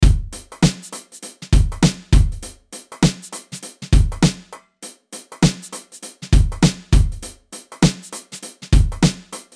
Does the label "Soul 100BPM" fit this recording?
Yes